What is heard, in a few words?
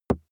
plastic cream bottle hit